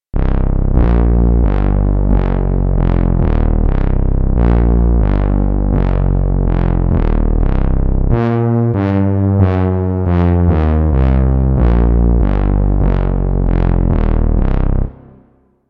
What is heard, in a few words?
bronze